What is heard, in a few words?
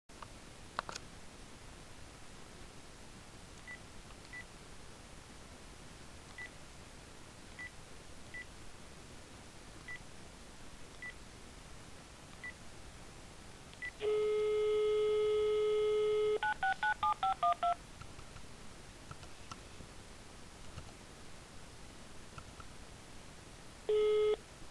failed to ring